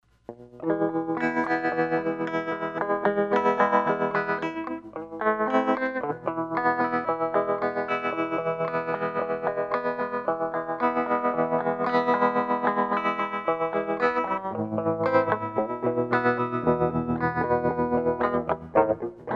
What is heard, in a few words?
tremolo